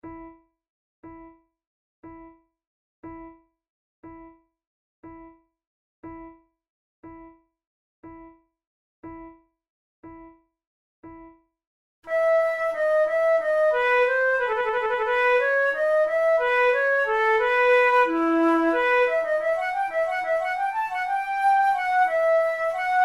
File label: two flutes air; Monteclair; 6 concerts; flute tunes